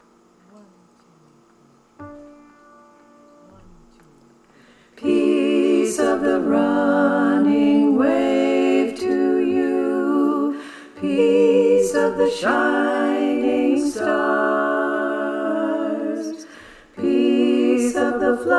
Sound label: All Parts, choir; The Freedom Choir